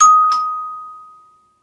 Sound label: Door Bell